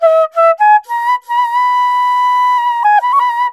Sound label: INDIA ALTO